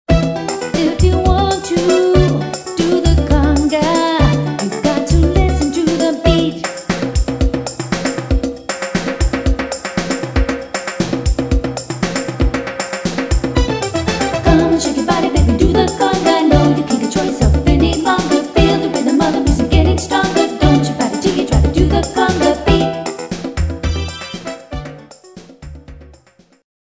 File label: Conga